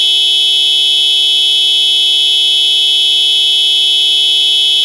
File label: disc double, horn